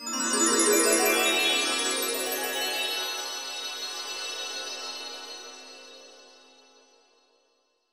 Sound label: genie magic; magic mirror